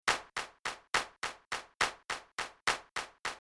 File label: Flamenco Fandango